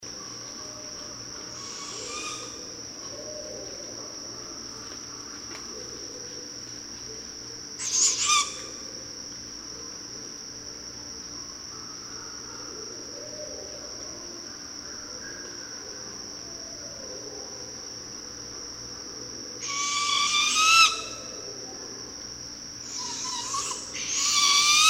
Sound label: Barred Owl Juvenile